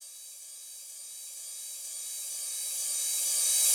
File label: FX Uplifter